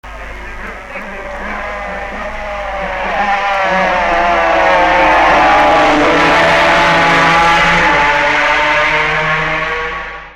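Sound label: Suzuki 50, motorcycle; 1966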